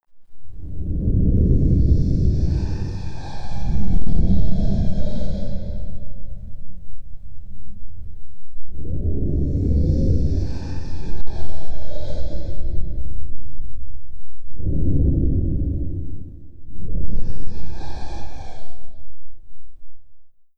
BREATH; HOLYSTONEKEEPER; Mob

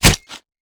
Spear Scale, Damage

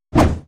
knife, Weapon

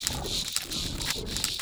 VOLCANIC GRUMBLE PEACE WALK

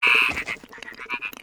VOLCANIC DEMOLIS WAR IDLE

